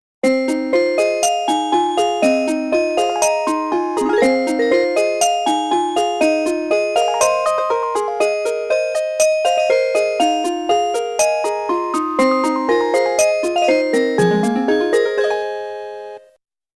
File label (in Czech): melodie